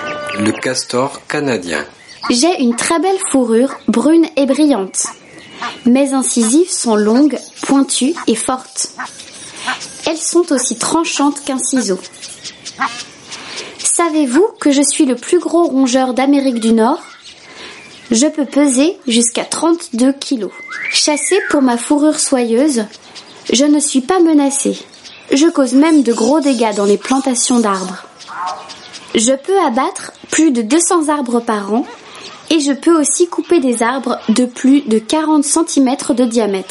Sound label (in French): Castors Canadiens